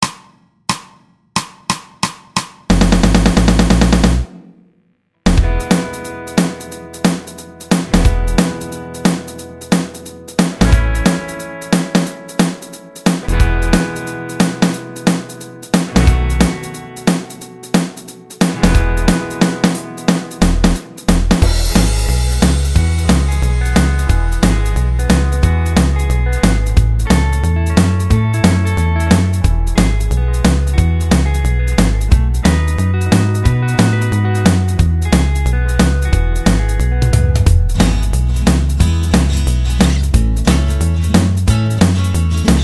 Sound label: Boogie backing